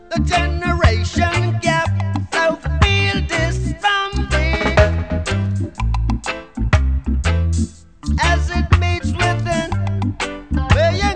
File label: reggae